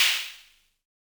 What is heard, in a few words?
Snare, Univox SR-95